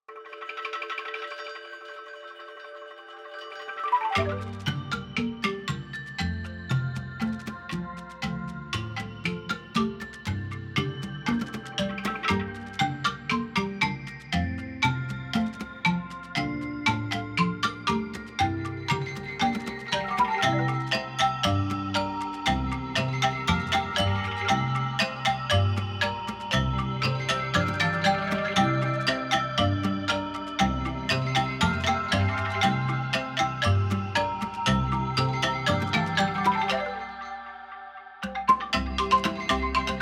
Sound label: Trung; Bunker Samples; Tactile; Tom Bellingham